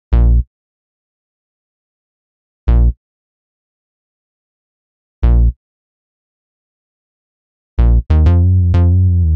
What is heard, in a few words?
Bass Combo